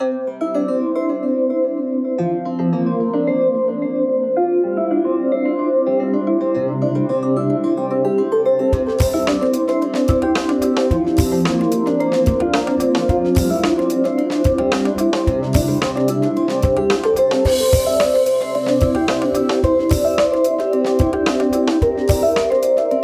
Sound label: Chill Acoguitar And Hip Drums